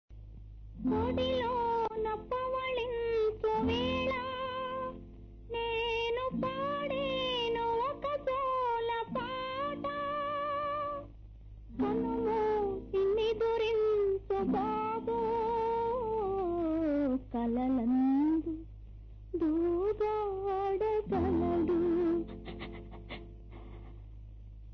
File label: sad bit, telugu